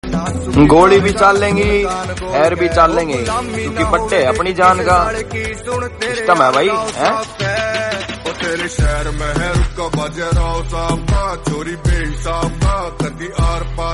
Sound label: Haryanvi